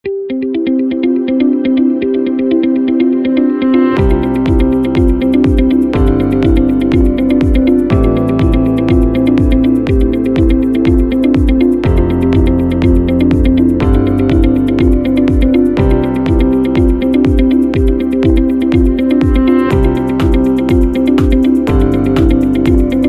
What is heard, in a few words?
Corporate Minimalism, corporate music; corporate music vol 4